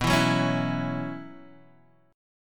B Suspended 2nd Suspended 4th, Guitar, Half Step Down